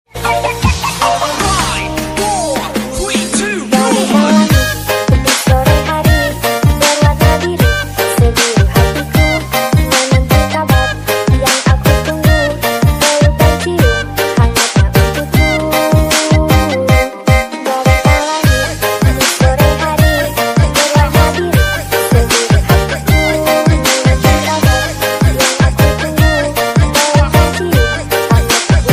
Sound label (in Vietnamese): Nhac chuong